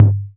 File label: CR-8000; Best Service Gigapack 2